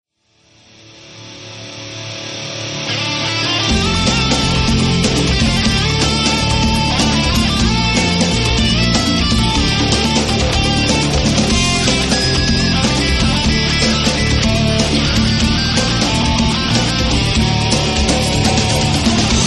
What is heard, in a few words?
solo guitar; Instrumental Rock